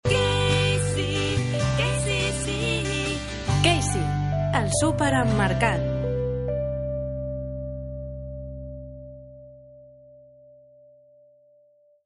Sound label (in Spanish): Jingle corto con voz; SUPERMERCADOS KEISY (Grupo FACSA)